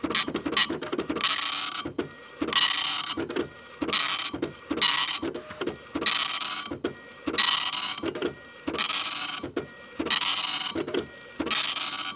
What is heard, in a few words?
PRINTER MONO